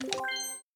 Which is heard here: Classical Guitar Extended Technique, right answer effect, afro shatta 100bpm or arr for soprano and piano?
right answer effect